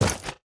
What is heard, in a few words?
step; smite; heimdallr